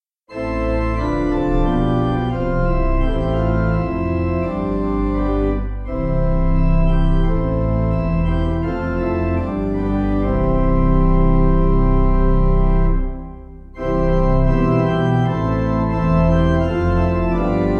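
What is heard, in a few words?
Shepherds Shepherds, Pipe Organ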